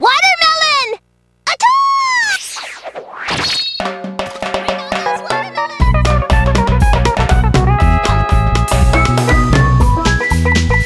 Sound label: watermelon eating contest ~ win; Taiko Drum Master